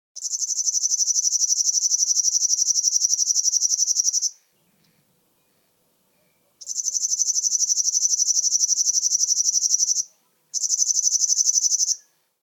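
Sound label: river warbler voice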